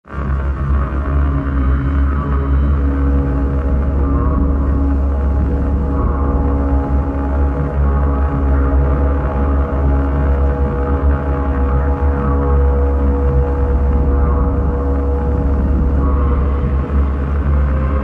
Lost Radio Drone; Raiders

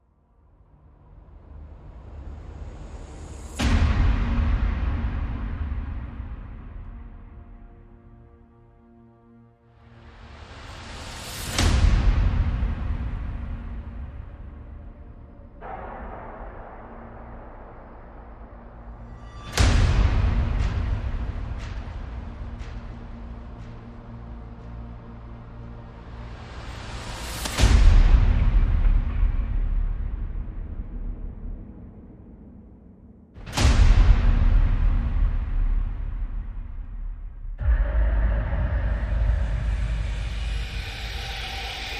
Percussion and FX

